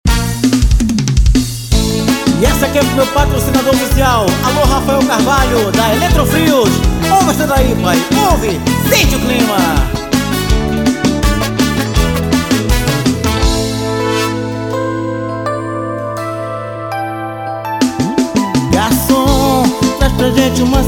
banda brega pop

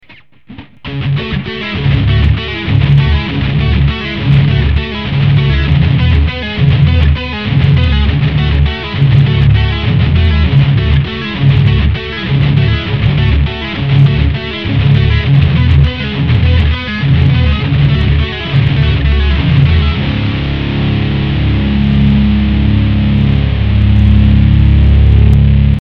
guitarras

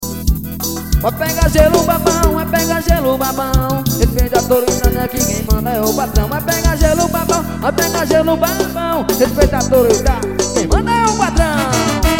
forro

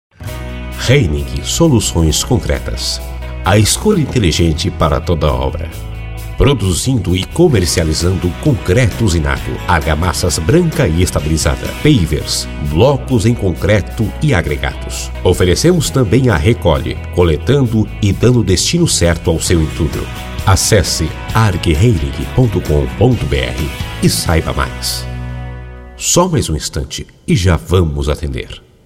espera telefônica; heinig

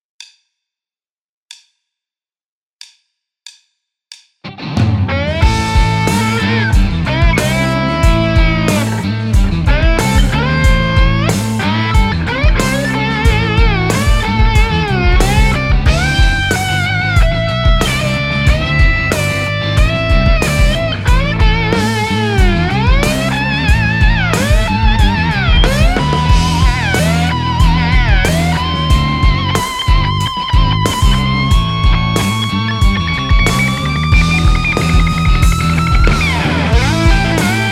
guitarra improviso rock blues

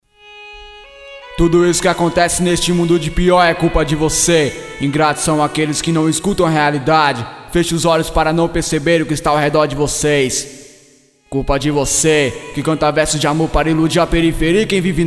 rap

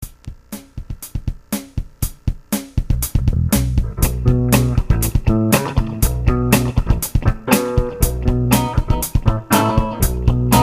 funk

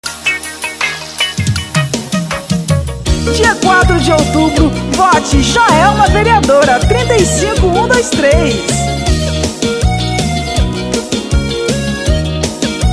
musica de politico, jingles politicos

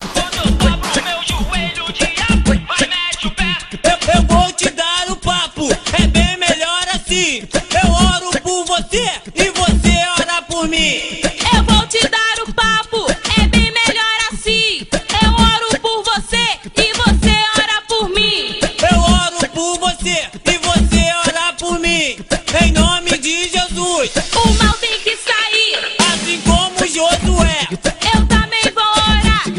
FUNK GOSPEL

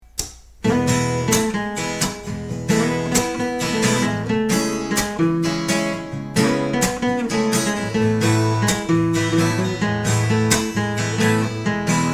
o caipira voz e violao

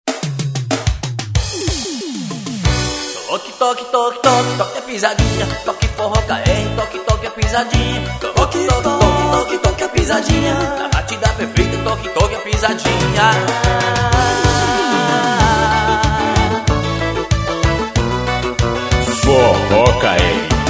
forro toque pizadinha

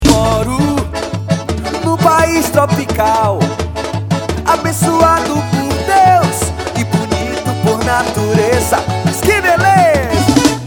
carnaval

